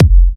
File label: Electro Kicks